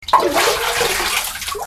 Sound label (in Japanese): mizu sukuu; easyrpg; Ib